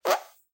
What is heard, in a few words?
Fart Noise; SoundsCrate